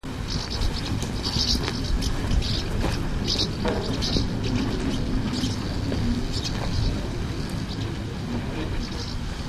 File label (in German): mehlschwalbe